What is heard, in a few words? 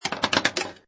Pinball Sound Ball Moving On Rail And Hitting Several Times; Video Game